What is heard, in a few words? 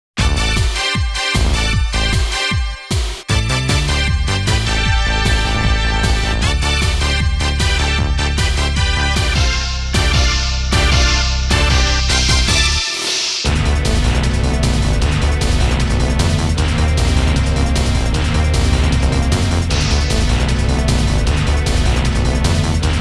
Ending BGM; Namco Game Sound Express Vol.26; Tekken 2